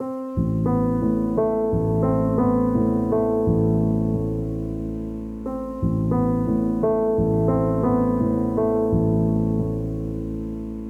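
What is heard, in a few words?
Mournful Piano, A minor 88 bpm; MIDI Clips; Tonal; Motifs; Ableton; Factory Packs; Mood Reel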